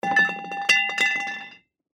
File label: bat drop